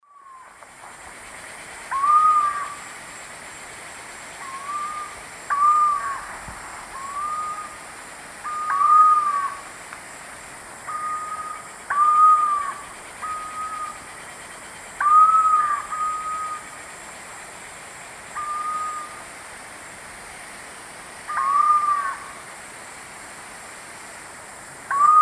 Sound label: Lipaugus vociferans, call; S. America; Eastern Lowlands